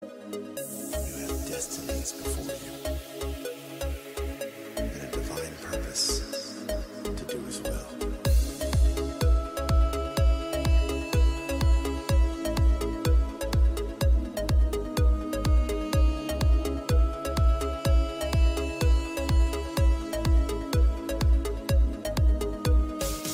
iphone classic ringtone

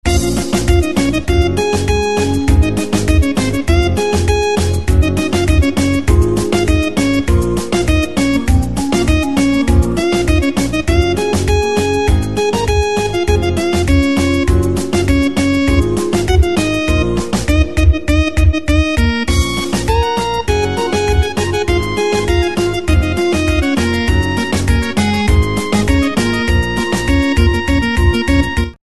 english ringtone

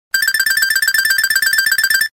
classy message ringtone

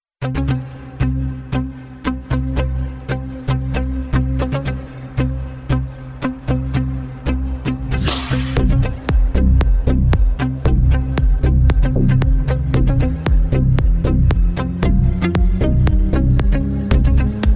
worlds best deep iphone ringtone

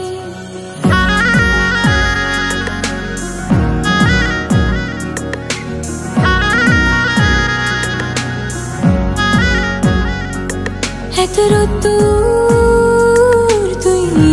bengali ringtone

